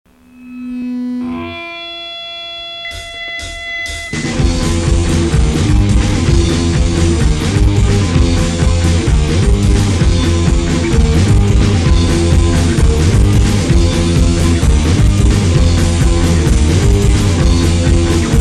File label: Instrumental